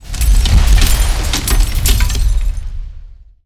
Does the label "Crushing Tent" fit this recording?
Yes